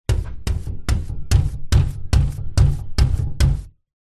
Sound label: household brush hand held sweep glass window; zapsplat